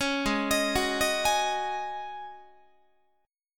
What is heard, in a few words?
G sharp 7th Suspended 4th G sharp, Guitar; G#7sus4; 16,16,18,16,19,19; arpeggiated